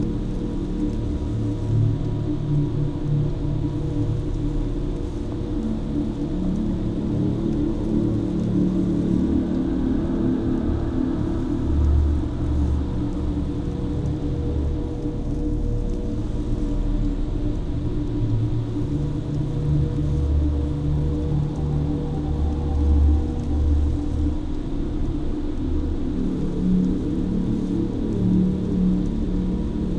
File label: ship titan idle; Stellaris; toxoids; ships